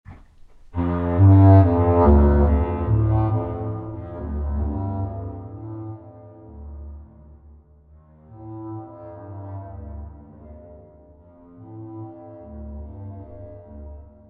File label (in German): Geisterschloss Klangstueck